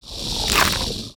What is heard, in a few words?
VOLCANIC GRUMBLE WAR ATTACK